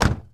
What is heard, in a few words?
Mustang Door Close; Automobiles